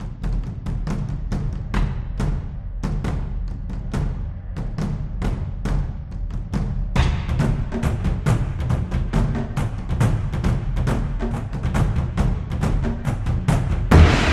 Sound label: Taiko